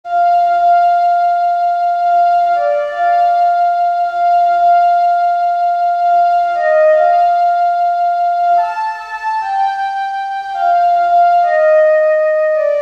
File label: flute